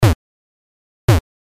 キック音; スパンカーの作り方

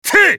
攻撃短; カート

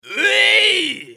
攻撃長; グルトルデ

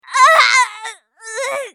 女 死亡; マスケッティア

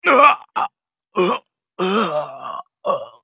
死亡; ナジブ

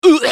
被ダメージ; パンファルロ(戦闘型); 戦闘料理人パンファルロ

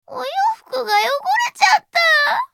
ダウン; ルディン

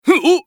被ダメージ; グルトルデ

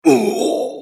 ダウン; クラウドボネ

